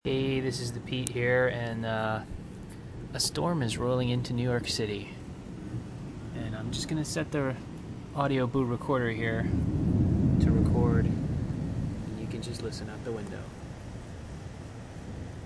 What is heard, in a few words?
a thunderstorm rolls into nyc listen to it approach; thephlog